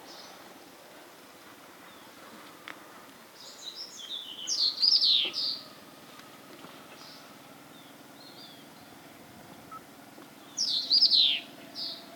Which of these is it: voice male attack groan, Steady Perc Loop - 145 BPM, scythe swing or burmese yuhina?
burmese yuhina